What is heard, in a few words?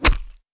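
crowbar hit body, weapons; valve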